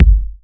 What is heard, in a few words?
Bassdrums